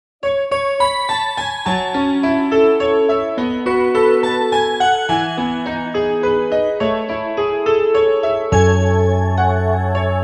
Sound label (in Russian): vals